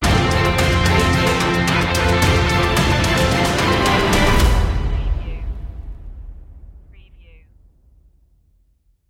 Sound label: victory in the battle stinger; media music now